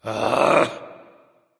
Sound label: "ugh"; smite; voicepack; ullr